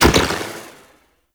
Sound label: Ball Shoulder Check Burst; Warframe; Missions; Tenno Ball; Tenno Scoop; Impacts